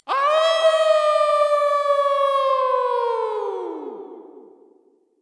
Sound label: howl